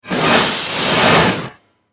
Dimension, Portal, Suction, Science Fiction, 5.1

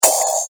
Shatter Sound, video game sound effect; electronic; Andrew Fleming

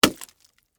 Impact Ice Block, Hammer; Miscellaneous Impact; BlastWave

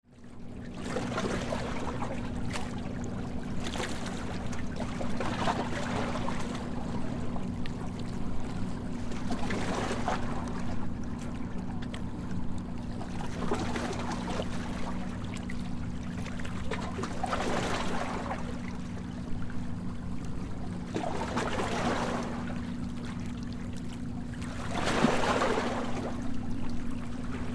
Wake Splash Against Dock Distant Boat; Nature; Water; Blastwave

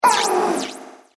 Flyby Laser Meets Air; Whoosh Sound Effects

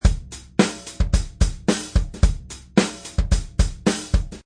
Drum Beat

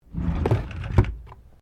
Drawer Laminate Small Full Close; Various domestic drawers; Household

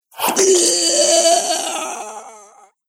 Sword Slices Man Who Cries Out; Battle Sound Effects

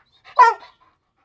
Dog Whiney Bark; Animal; Dog Sound Effects

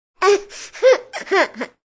Toddler Laughing, Toddler laugh; Laughter; Voice

